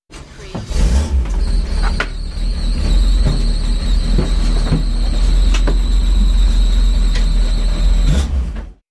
Rally car starting; beanstalk audio

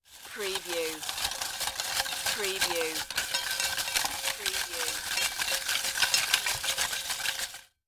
Paper Spinning in Metal Pot (Ticket Cage)

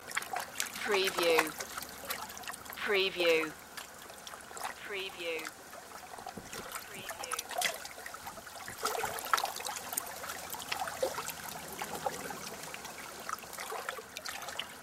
water lapping long

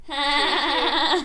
Annoy Laugh

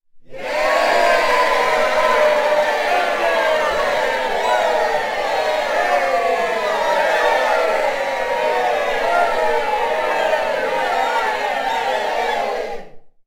Crowd Cheering

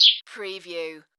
Sparrow

